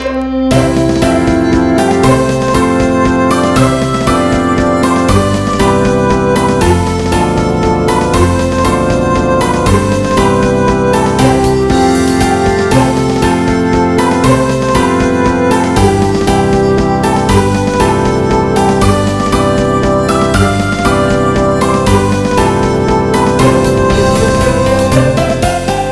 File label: New Mexico Spanish state song, MIDI; United States